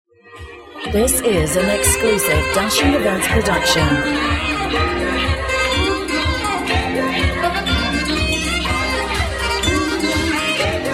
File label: Sweet Saxophone Dreams